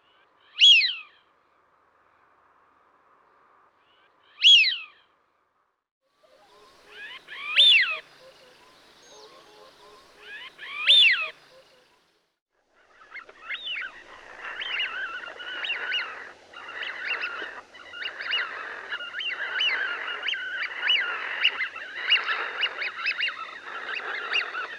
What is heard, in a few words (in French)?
Canard siffleur; sonotheque ornithologie